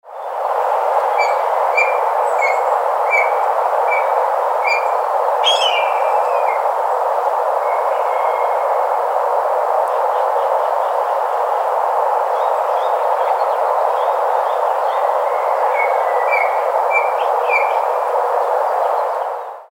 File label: STJA piping call